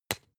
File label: spice jar smack open short; industrie wirtschaft; gastronomie